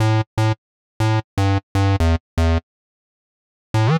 Synth Bass A; PowerFX - Nu Jazz House

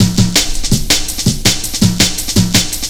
165 break, Drum Loops, Drum & Bass; Total Drum&Bass

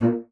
A#2, bassoon; Xsample VOL-6; C BASSOON

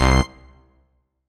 Dance Mega Synth; Best Service; 90 s Sample CDs

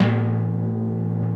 TIMPANI; Crypton - DrumBase5000

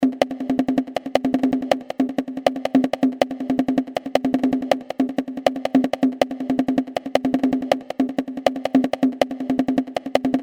Undynamic, percussion; Industrial

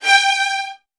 G3, VN 16TH, Virtuoso Strings; ILIO; Kirk Hunter

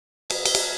Cymbal Fill; Percussion; Essential Percussion; Ride Cymbal Patterns

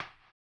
HAMBONE, Vocal Blues Soul; Spectrasonics Vocal Planet

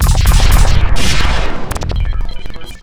FX FILL

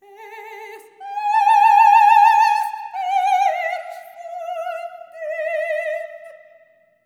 SOP. TRAD, Symphony of Voices; Spectrasonics

